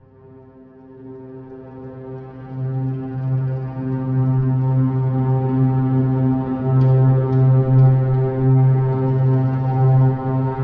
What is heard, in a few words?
Drill